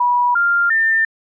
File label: error, iPhone 3GS UI sounds; Apple